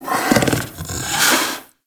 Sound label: Kubrow Tame Sleep Idle Snore Fidget G; Warframe